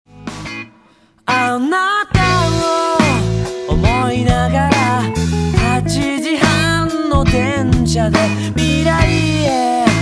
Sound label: music